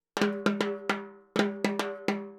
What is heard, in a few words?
Timbali, Latin Percussion